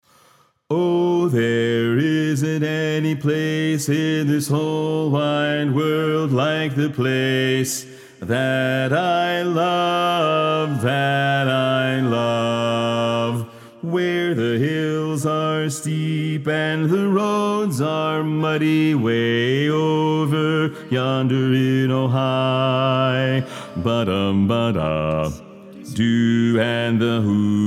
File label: Bass